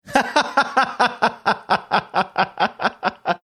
laughing

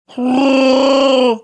making chewbacca sound

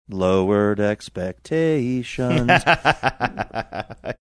singing lowered expectations

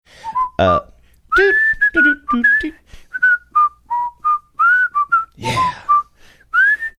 whistling singing portion

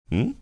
"mmm"

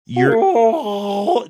attempting chewie impression

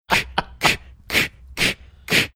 making beanbag catching sounds

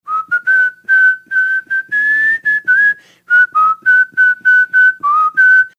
whistling portion of song

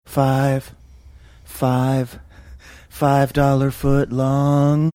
singing

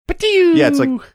making a spittoon ricochet sound

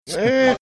making funny sound

